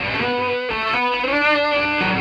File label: Electric Guitar